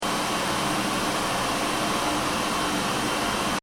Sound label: Hand dryer loop, Hand Dryer: Automatic, Public Washroom, Running; Miscellaneous Electronic; Electronics; Stuart Duffield